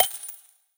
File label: coin collect; geometry dash